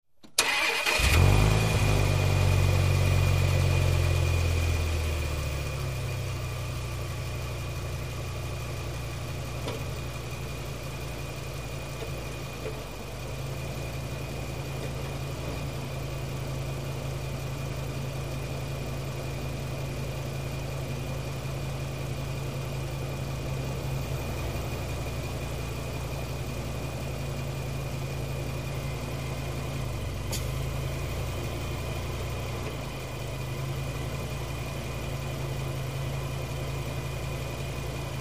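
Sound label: Dodge Ram Start Idle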